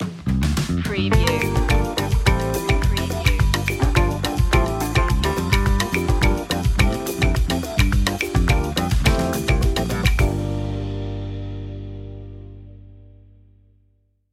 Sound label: classy night stinger; brisk